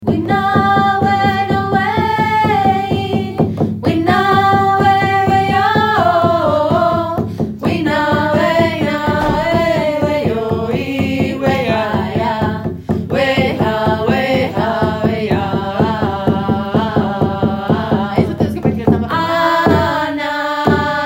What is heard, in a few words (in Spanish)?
canto de danza para mujeres, canciones tambor danza de los espiritus